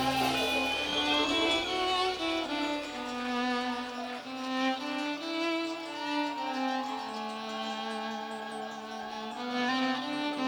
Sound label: Violin Improv; Bluegrass